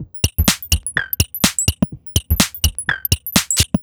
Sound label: 125bpm, House Drumloops